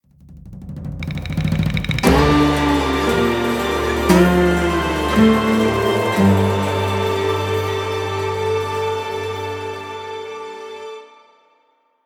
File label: China Lose